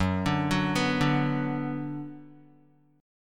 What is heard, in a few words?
Gb Suspended 4th Sharp 5th Gb Guitar; arpeggiated; JGuitar